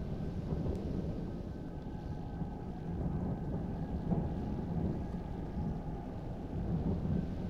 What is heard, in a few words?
multiplier Loop; arcane